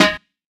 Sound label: rich lows steel snare drum sound g sharp key